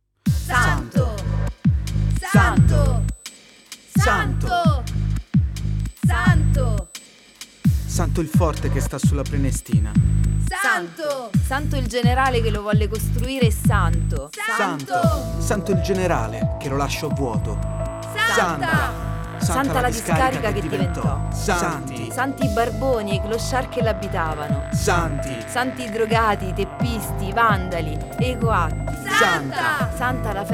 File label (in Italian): musica voce